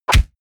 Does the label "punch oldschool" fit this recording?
Yes